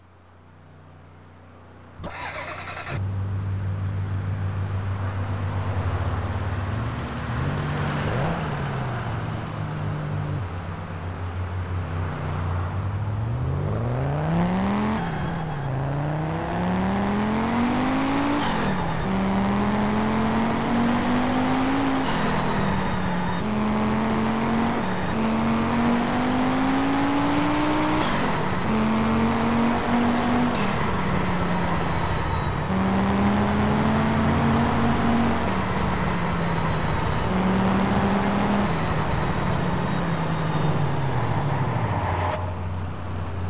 audi sport quattro; 1983; TDU-1

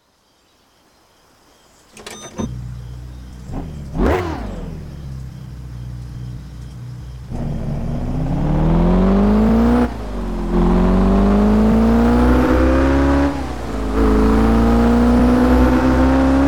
ferrari 488 with shiftup; TDU-1

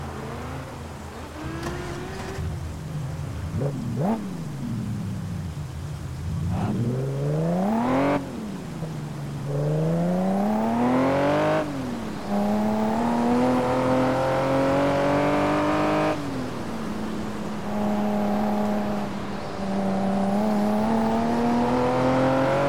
aston martin db7 vantage zagato; TDU-1

